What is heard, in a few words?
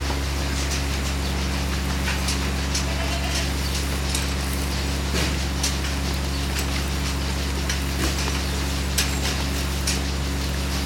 ambience farm goats stall feeding milking equipment; sound effects one